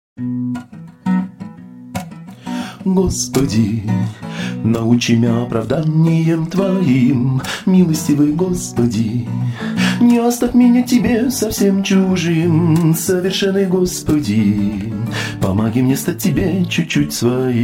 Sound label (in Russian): Милостивый Господи